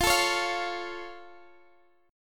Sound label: C sharp Augmented, F